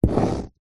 office pen ball point draw line short paper on plastic table